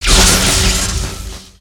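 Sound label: electrocution; foley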